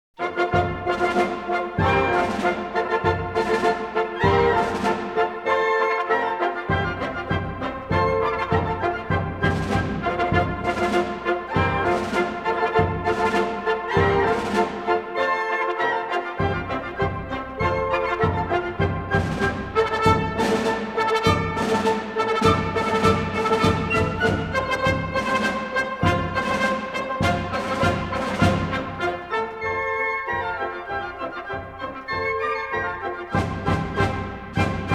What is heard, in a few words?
Wind Ensemble, March for Military Music in F Major